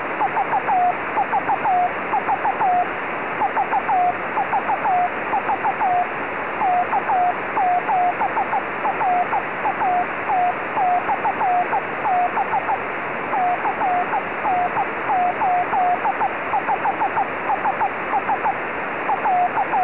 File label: beacon